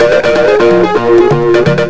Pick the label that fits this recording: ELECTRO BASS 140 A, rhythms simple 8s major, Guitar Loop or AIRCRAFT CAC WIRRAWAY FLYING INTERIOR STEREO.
Guitar Loop